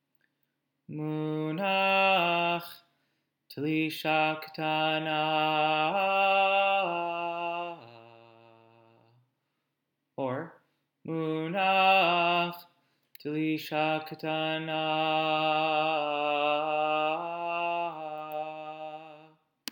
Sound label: Torah Trop Pattern